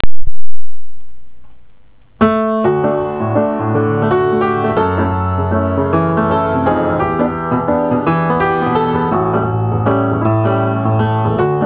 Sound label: Piano Composition